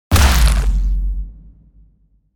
Gun Fire For Futuristic Game

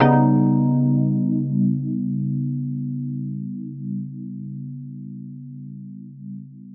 Synth Sound

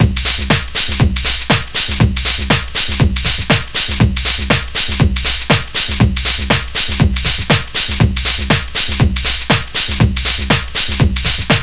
120 Fat Beat

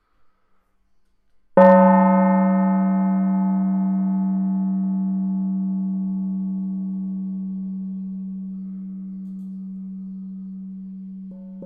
gong

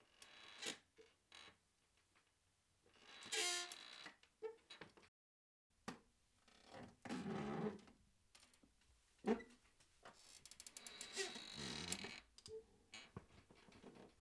Creeking Chair

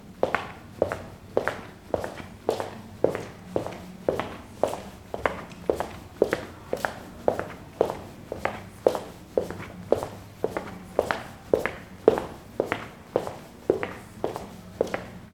Chamber Steps

